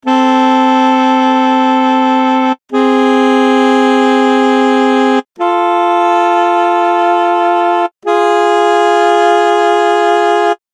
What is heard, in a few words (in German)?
Altosaxe linear; kleine Sexte, dann eine große und eine kleine Terz, zunächst in einer linearen Aufnahme